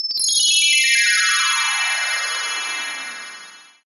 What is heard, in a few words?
Collect Meteor Medal; Super Mario Galaxy 2; Wii